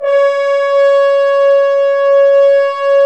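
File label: French Horns f, Dry; Roland LCDP06 Brass Sections; F.Horns 2 f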